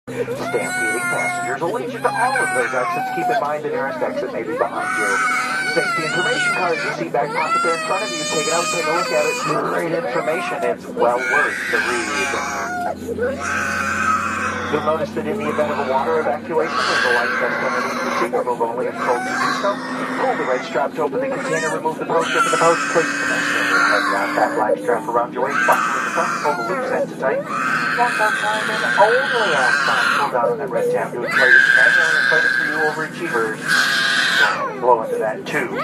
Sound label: Screaming Baby; Southwest